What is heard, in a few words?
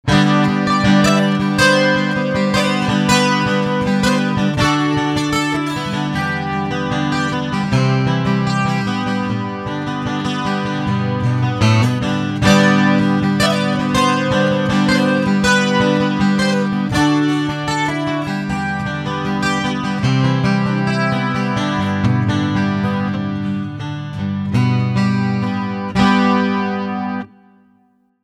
String